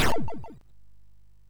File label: sid MOS6581R2